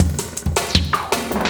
Loop, Drum & Bass, 160 BPM

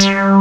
PULSE Q BS, TECHNO; Pro-Rec - Synthsonic; Z1 TECHNO

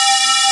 P5 PIPER C3, Triangle Syn+Air

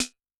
Ana Buzz, Yamaha DTXpress-II snare

